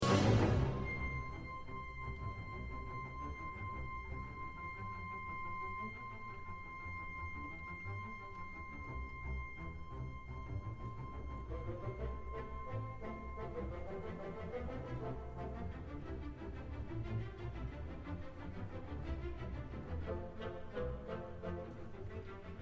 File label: Cellos & Basses; III - In ruhig fliessender Bewegung; London Symphony Orchestra; Mahler Symphony No. 2